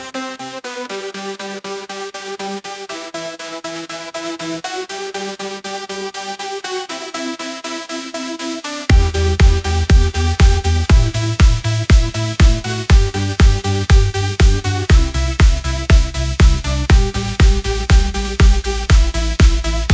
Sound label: ringtone kpop